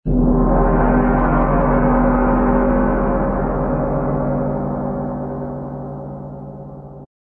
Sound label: perc gong giant